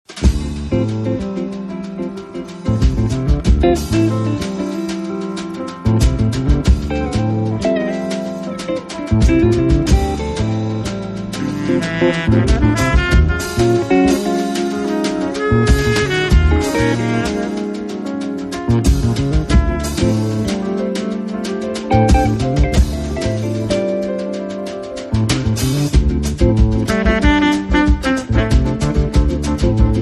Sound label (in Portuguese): samba no balanco do jazz